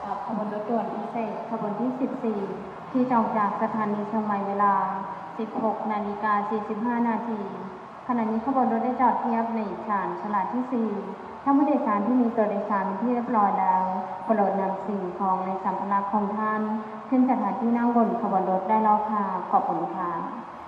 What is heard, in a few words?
station